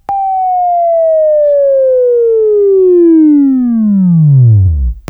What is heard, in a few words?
Buzz, Left; Sweeping Training